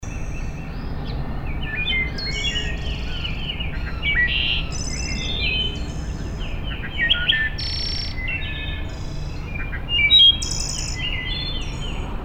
Wood Thrush

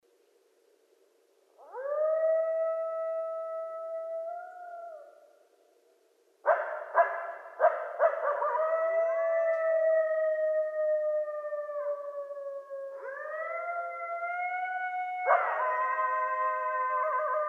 Coyotes; Coyotes (Canis latrans)

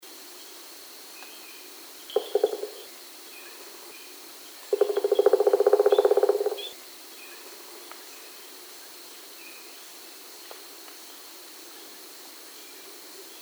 Pileated Woodpecker

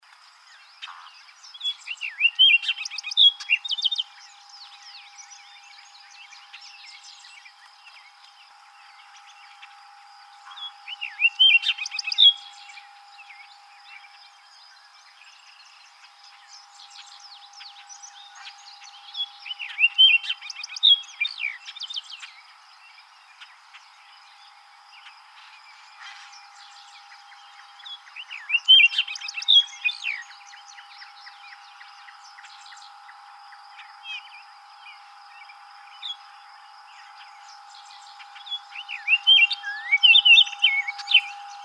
Orchard Oriole

